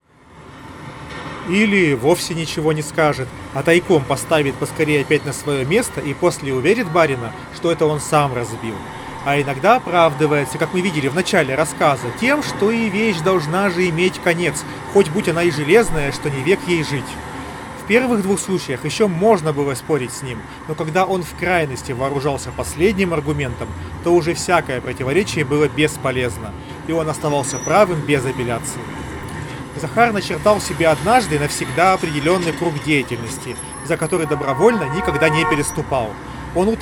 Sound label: Recorder noise; huawei freebuds 6